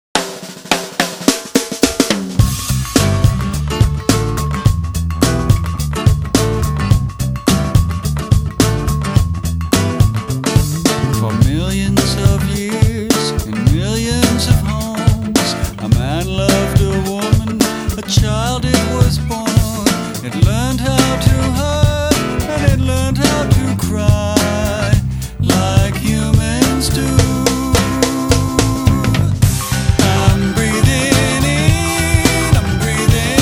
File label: music